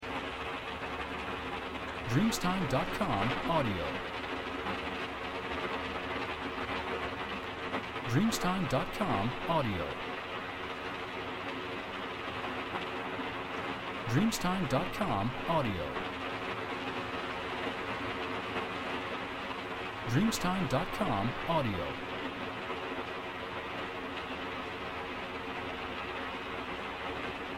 Rain Pouring from Inside Car

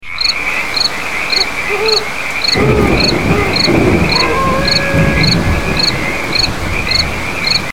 Night Time Storm

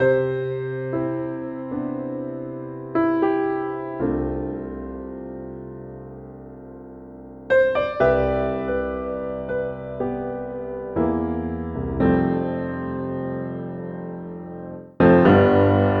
Intro in C

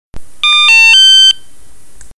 Message Beep

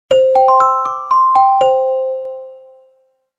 bells sms

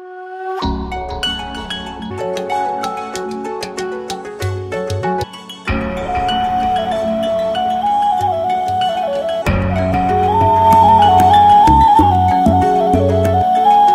Oppo A9 Piano

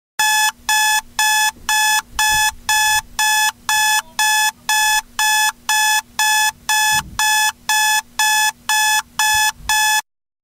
Popular Alarm Clock Sound Effect

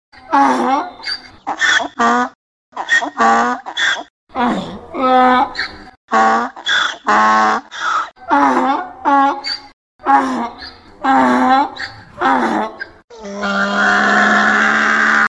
Donkey Sound

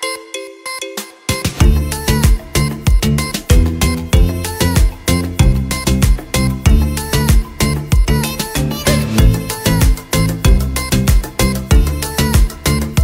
Vivo Ringtone

